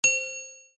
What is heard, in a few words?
success